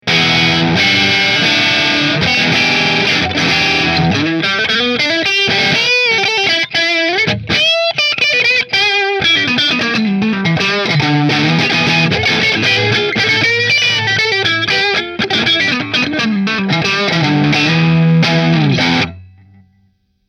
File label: Goldtop Middle Marshall; Tokai